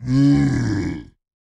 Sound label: Zombie Growl; Smashy Road Wanted 2 android ios switch gamerip 2020